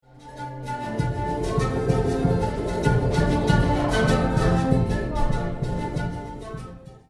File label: pan flutes